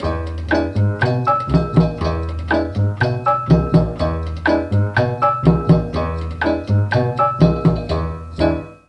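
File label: B2 CHA CHA FLUTE, mellotron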